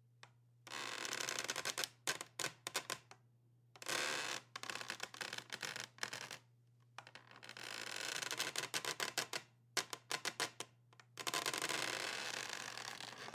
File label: door hut